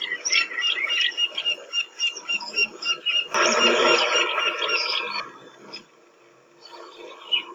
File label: poss bulbul